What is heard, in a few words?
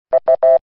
times up; jeopardy